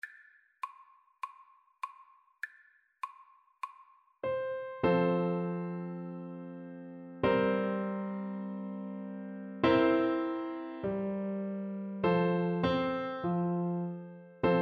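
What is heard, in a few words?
america the beautiful, vibraphone; Percussion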